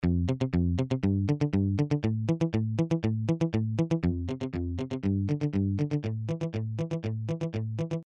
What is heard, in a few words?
Delay Stereo LR